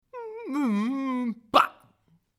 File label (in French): chaise bois tirée; Mobilier